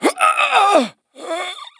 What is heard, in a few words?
death